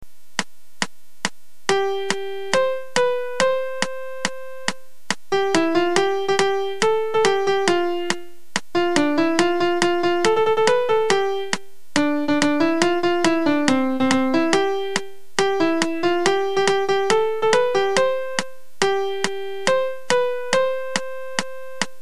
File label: Milk Song Beat